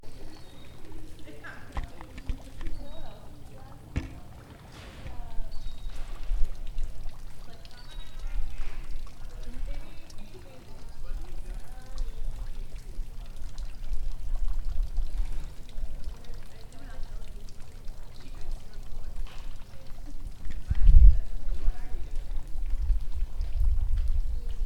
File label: soundscape Seal; Scripps